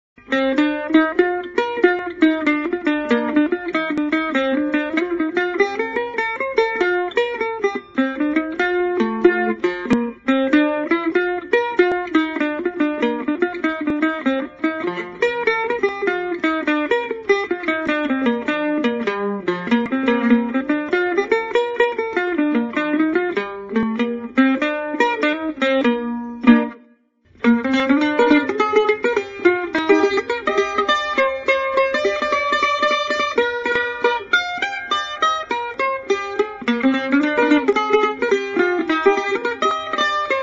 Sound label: mandolin